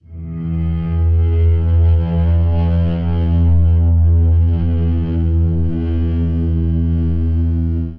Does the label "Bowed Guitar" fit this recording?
Yes